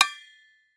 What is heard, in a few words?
Impact Propane Tank Hit By Medium Metal Pole